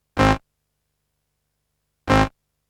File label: Bass Hit; Multitrack